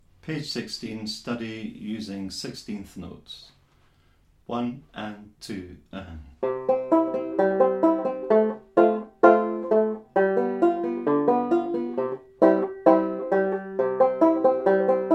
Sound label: study using 16th notes; Bradbury Studies